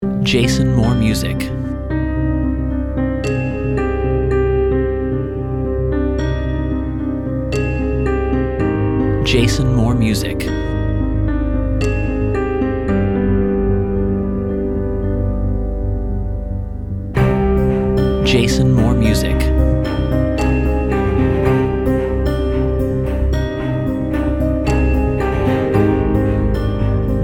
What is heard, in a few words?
Negative Tension